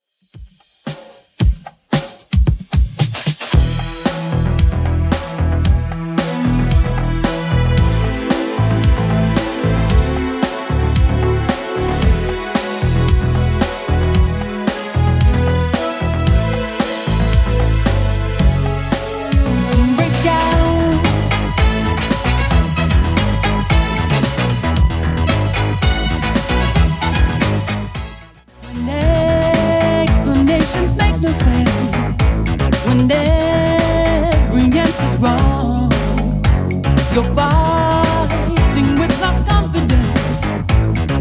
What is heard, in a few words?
80s Redrum 113bpm